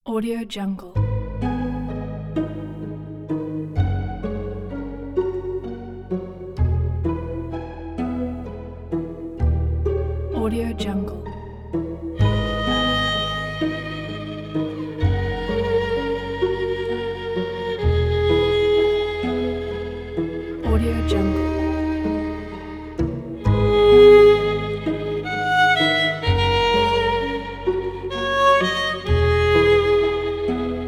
very sad violin

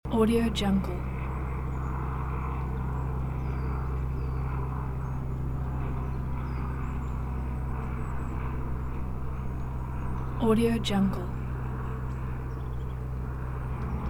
patrol plane flying around winter forest; fxprosound

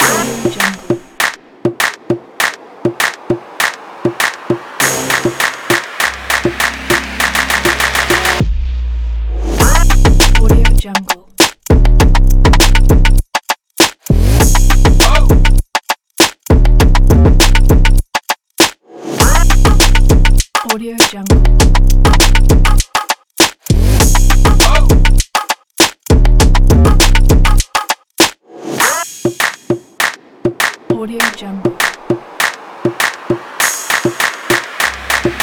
the rap

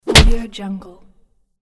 punch

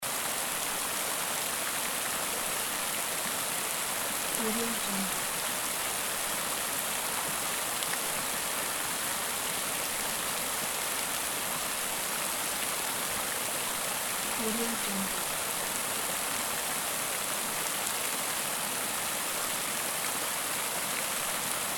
large fountain loop; tibasfx

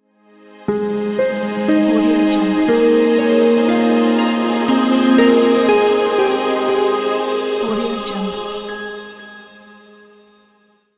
wonderland intro

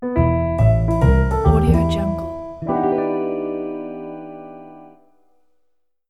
jazz logo; filuanddina

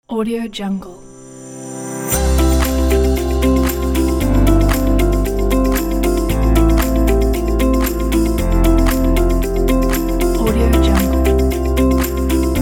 corporate motivational background

